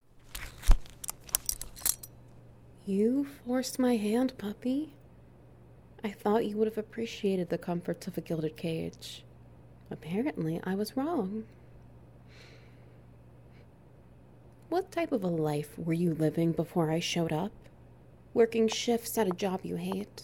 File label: Ambience SFX Wet Noises; The Good Pet Box